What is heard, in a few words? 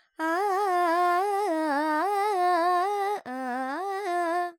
Pentatonic, Vocals, Carnatic Female, Alaps, Dry; 70 G; Sample Banks